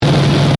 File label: Distortion; Sound Design; BlastWave